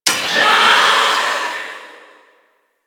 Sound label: Grimoire Vulnerable Impact; Enemies; Warframe; Entrati Doppelganger